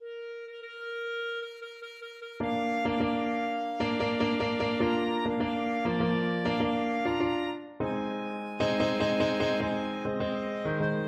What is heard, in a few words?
march clarinet; aida